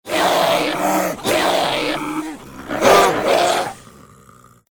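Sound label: sounds of bear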